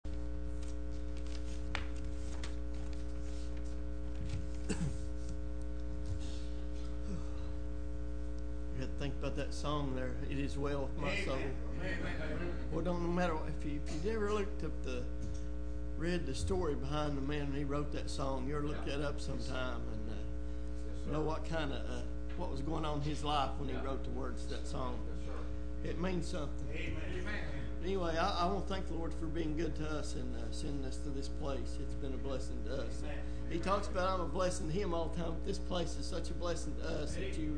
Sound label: Singing; 2015 missions conference